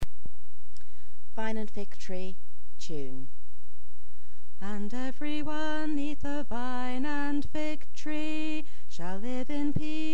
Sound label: vine tune